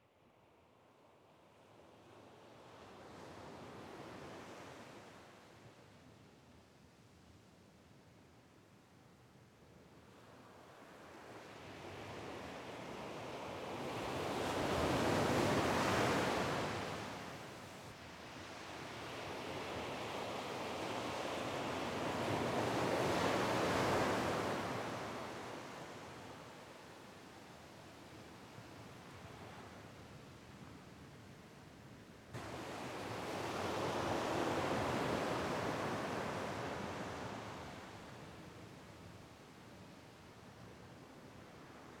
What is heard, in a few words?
wave sequence; Sound design for the opera composer: concepts and methods; Her face was of flowers audio cues